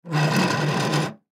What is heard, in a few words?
Chair Wood Stand Up Push Back Scrape Floor; Chairs